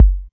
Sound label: 808 Kick; Novation Drumstation V1